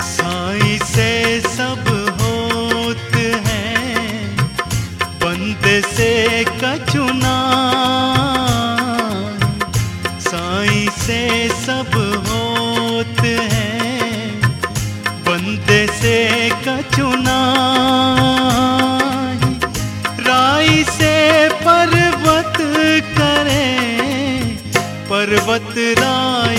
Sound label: ringtone